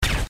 real pistol shot

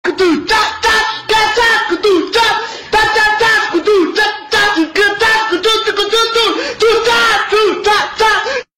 tu tataaaaaaaaaaaaa

